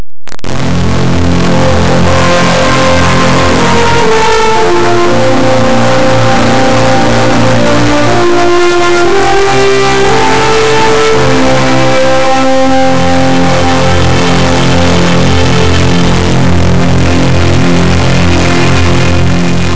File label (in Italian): musica newage